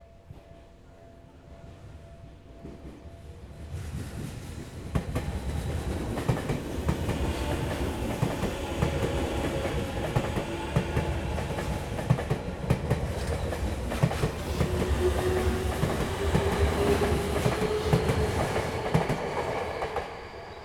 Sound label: ms train raw